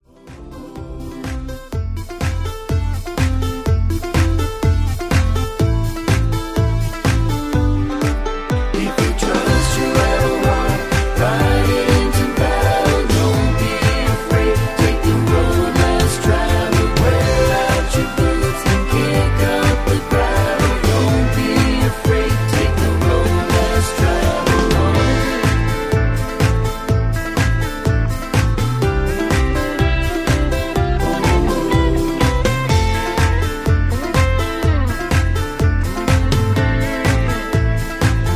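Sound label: Instrumental with background vocals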